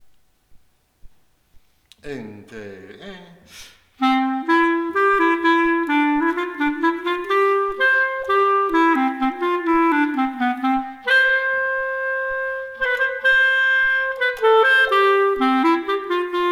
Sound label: hoedown, Skilful Duets